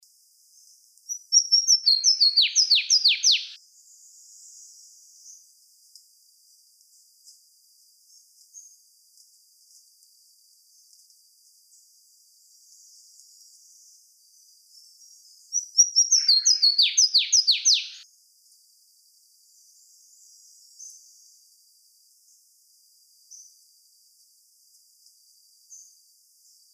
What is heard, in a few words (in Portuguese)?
Som Canario do mato